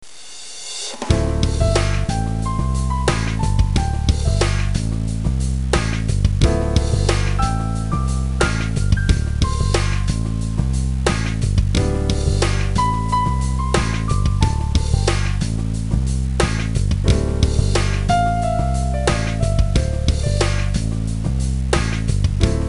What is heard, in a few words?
piano